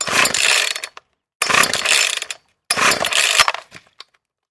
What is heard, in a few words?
Chain Saw: Cord Pull, False Start; chainsaws